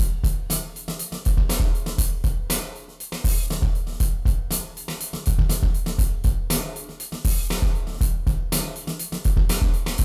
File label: 120 heavy stereo loop